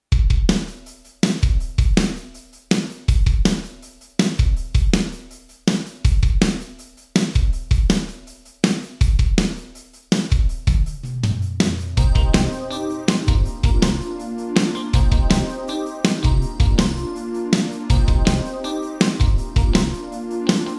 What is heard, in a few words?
Drums Sony HR MP5 Wood Room Vintage Digital